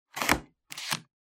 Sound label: Hole Punch Punch